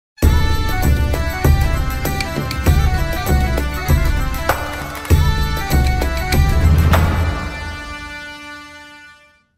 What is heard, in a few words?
Bagpipe Song; Spyro Reignited Trilogy; Spyro 2